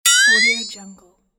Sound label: cartoon flexatone comedy accent